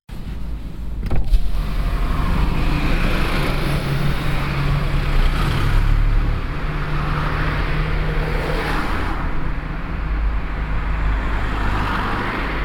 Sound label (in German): Parkstreifen; verkehr